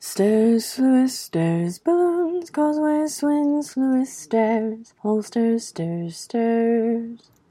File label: map song